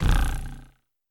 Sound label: arrow in castle; Hold Em